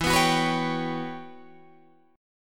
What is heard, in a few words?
E Minor 6th Add 9th guitar chord, Open D